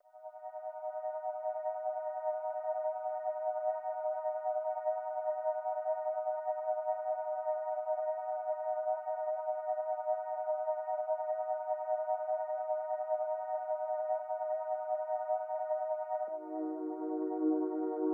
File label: 118 BPM D# Min Pad; Loop Stems; Cymatics; 2022 Melody Collection Platinum Expansion; Miami